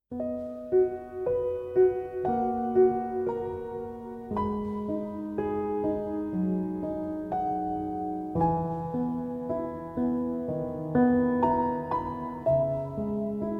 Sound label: Dont Leave Ringtone Piano Music